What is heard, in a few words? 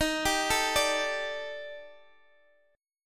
D sharp Major 7th Flat 5th, down, chord